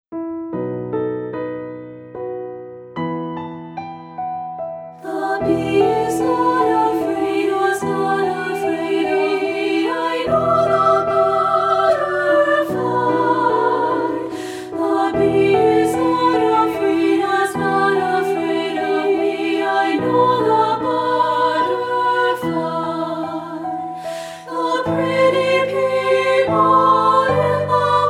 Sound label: Treble Chorus